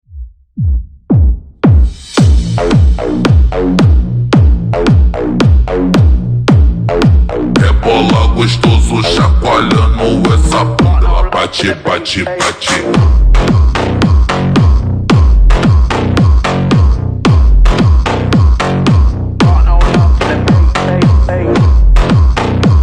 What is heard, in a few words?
phonk slowed reverb